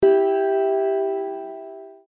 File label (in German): Zithermacher Ton F LAUTER